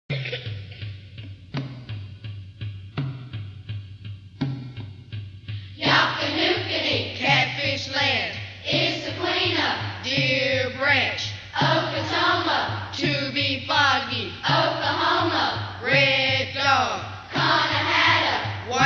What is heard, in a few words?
indian chant